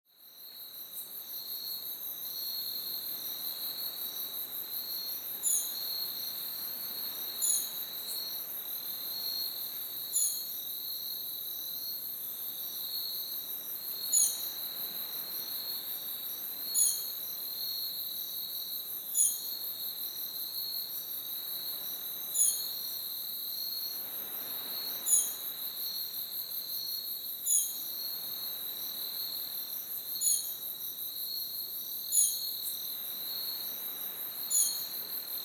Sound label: night by a mangrove swamp daintree national park